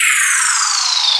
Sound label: High Atmos; Urban Shakedown